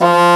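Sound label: Contra Bassoon, F1; Digital Sound Factory Virtuoso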